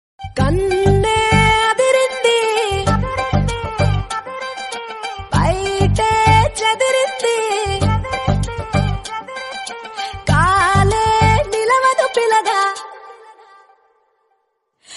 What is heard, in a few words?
ringtone telugu songs